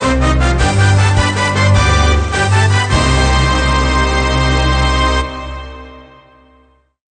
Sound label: Music Game General event